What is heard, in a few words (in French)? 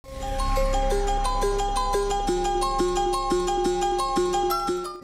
fin de téléchargement